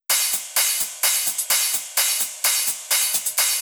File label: Drum Loop 128BPM Perc; Loop Elements; VEC5; Drumloops; Multi Loops